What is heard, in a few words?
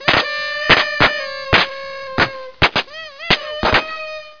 party; firearms